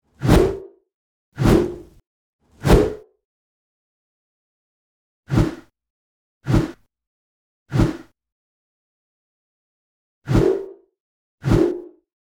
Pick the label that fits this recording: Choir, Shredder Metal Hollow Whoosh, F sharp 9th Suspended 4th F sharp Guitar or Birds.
Shredder Metal Hollow Whoosh